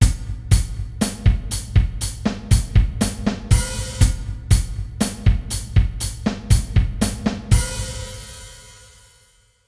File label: 60 bpm, batteur